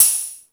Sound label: High Hat, Drum Machines E-MU Pro-Cussion